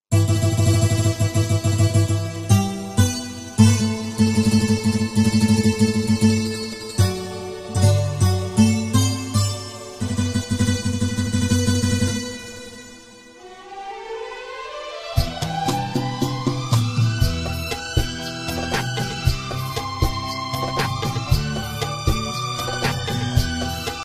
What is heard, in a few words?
start music ringtone